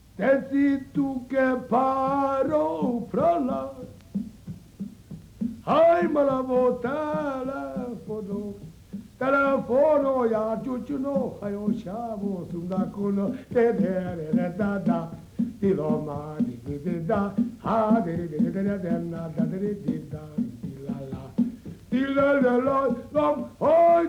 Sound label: voice